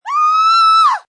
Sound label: Scream Female, Moderate, short female scream. Woman screaming, scared; panicky; Screaming Sound Effects